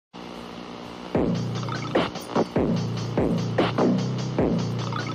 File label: skeleton crowd